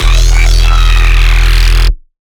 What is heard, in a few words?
Bass Shot